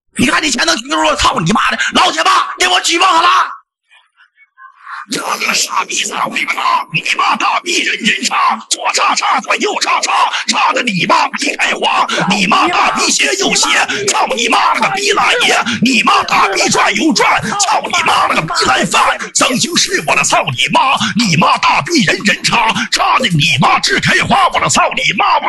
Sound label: Vocals; 南蛮大布